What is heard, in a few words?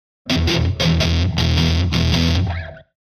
Elect Guitar Hvy Rhyt, electric guitar